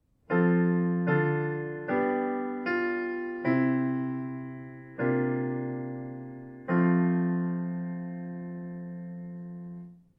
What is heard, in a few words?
IV V Modulation